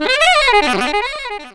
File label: SAX RIF